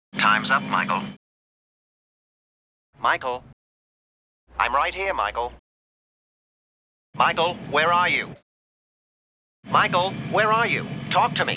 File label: alarm